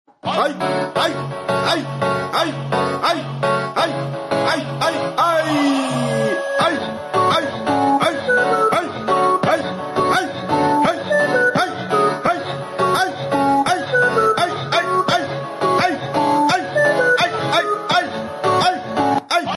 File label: Phonk